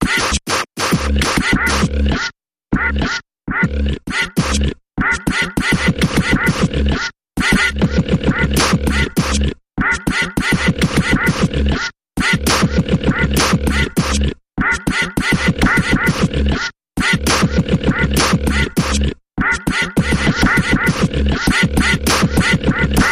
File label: Mechanical Percussion Variation